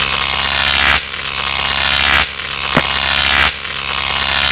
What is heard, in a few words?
Electric; 1001 Sound Effects